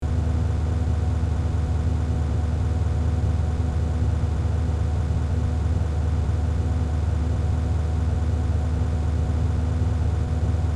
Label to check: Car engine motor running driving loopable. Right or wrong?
Right